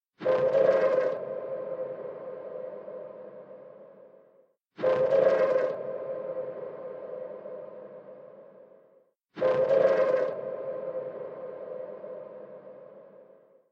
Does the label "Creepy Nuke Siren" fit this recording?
Yes